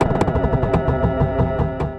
Triggers Slowing; Ableton; Granulator III; Device Templates

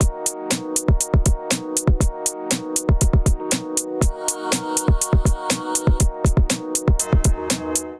Deep Knight Kit, Drums; Ableton Factory Packs; Beat Tools

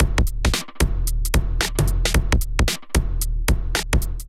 Kit groove 112 bpm; MIDI Clips; 111 - 130 bpm; Cosine Kit groove; Ableton; Factory Packs; Drum Essentials

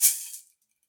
SHAKER; Ableton; Factory Packs; Drum Booth

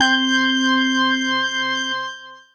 Twisted Organ, Piano & Keys; Ableton; Factory Packs; Chop and Swing

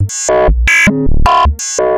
Bass Effects

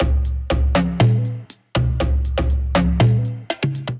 Hand Drums Kit, Acoustic

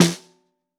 SN2 Bottom, Snare; Ableton Foundation; Snare Designer

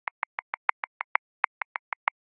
Groove; 6 Feel; Ableton

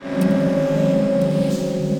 Ominous Pads; Cybernetic; Ableton; Mood Reel

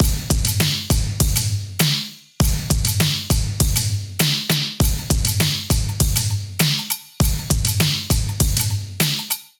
Acoustic Australia Kit 100 bpm, Drums; MIDI Clips; Drive and Glow; Ableton; Factory Packs

